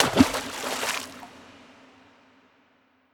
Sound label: City Silo Pellet Splash; moul-assets